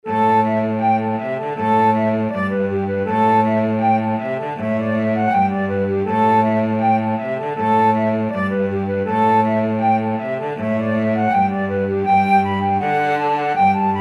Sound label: flute cello duet